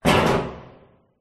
impact metal oil drum empty

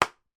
toy sword impact body; sound effects one

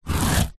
foley foam dense single scratch sharp object

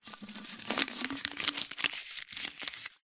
foley money notes remove from inside glass jar

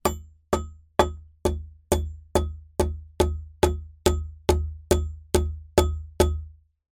industrial impacts hammer wood fixed beam

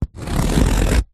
cardboard score cut slice with knife

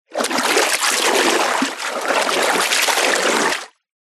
nature water movements surface deep; zapsplat

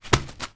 foley paper scrunched up throw hit table

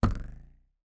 cartoon balloon hit twang pitch ascend bounce; zapsplat

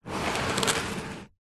wood box heavy slide concrete

